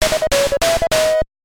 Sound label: Pick Up a Bonus; Balloon Fight; animal crossing full gamerip